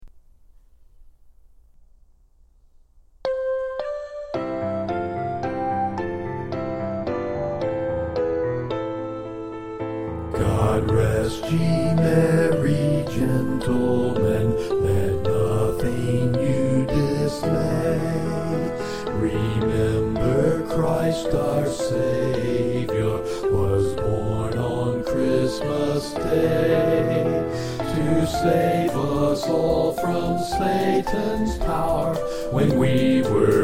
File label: God Rest Ye Merry Gentlemen Vocal